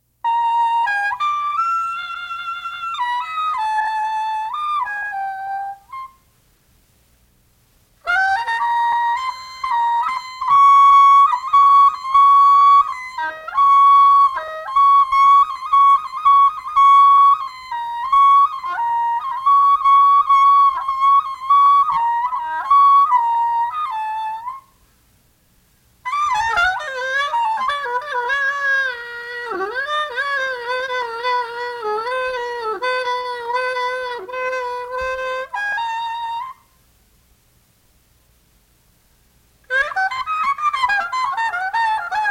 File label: solo soprano sax